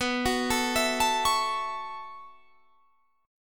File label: B 9th Suspended 4th B down Guitar Standard; B9sus4; arpeggiated; JGuitar